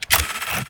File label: p atk lightning